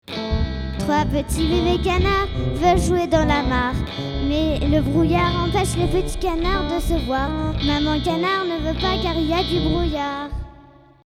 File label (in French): les petits canards